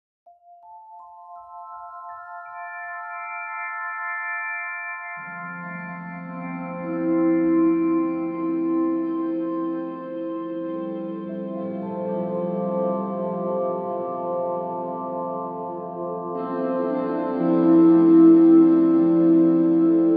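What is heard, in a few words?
C major lydian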